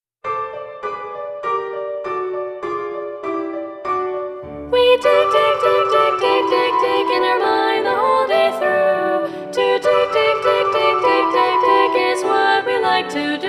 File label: SA Balanced Voices